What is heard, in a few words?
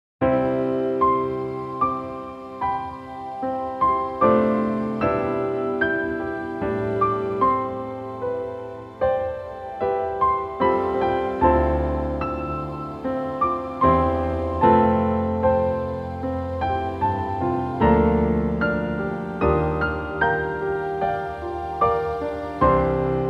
pitch example